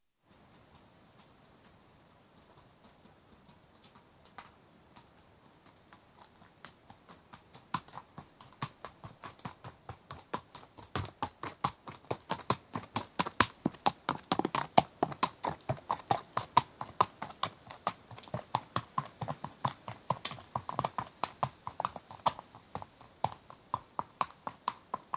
One horse walks past on rough track, right to left, some wind noise, big gust at end of track; Horse Sound Effects; BBC